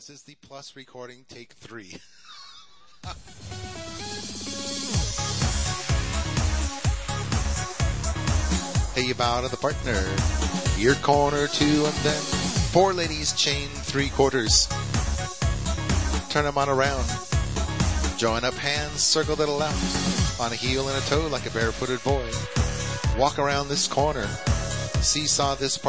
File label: Patter